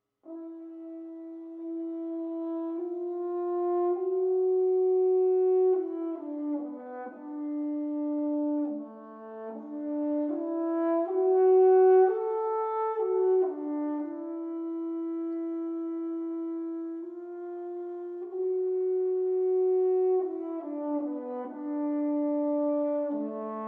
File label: Cello Concerto; Dvorak